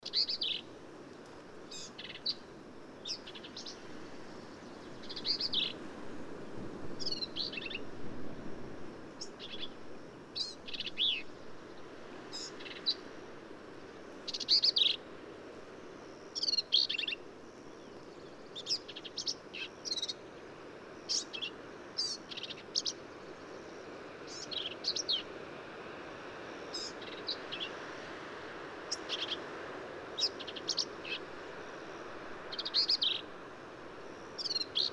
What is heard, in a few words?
Black-capped Vireo